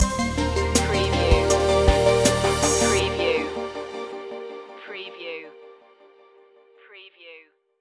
shimmer stinger

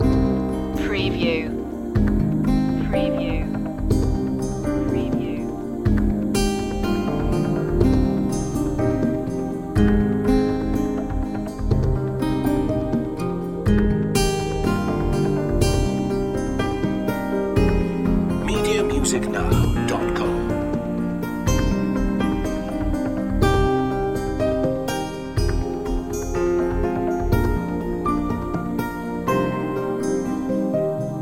gulf dream loop

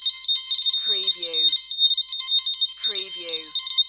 Space Scifi Sound - communication

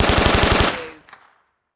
Submachine Gun Sten

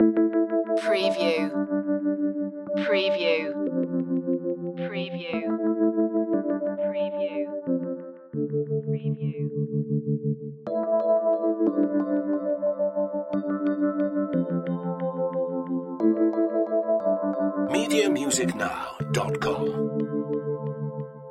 hip hop loop

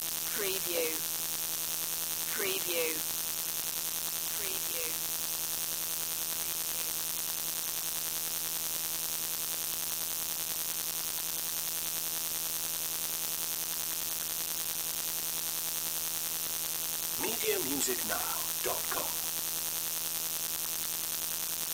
Electrical Current; Sound Effects Bible

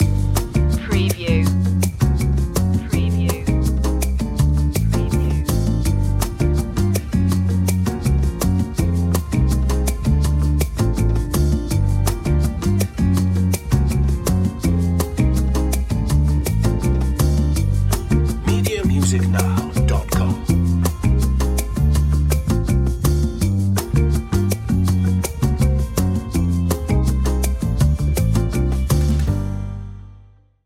breezy night lounge

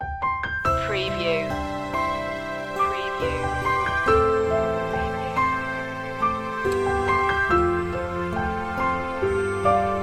fragile orchestral

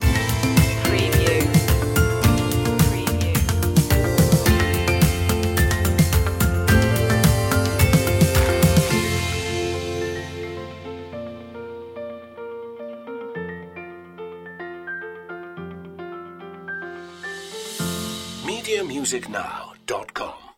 taking flight

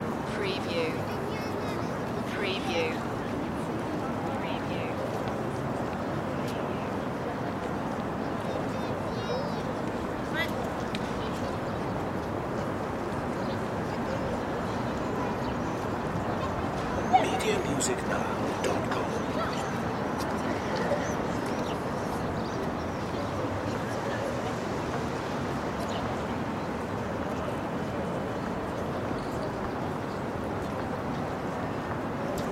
kremlin square ambience

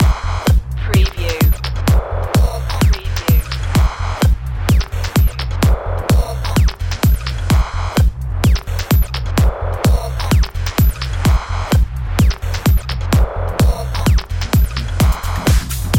let go loop

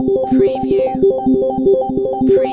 Slot Machine Arpeggio (loop)

